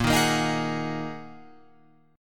A sharp 7th Suspended 4th Sharp 5th, Guitar Open F chord; strummed; JGuitar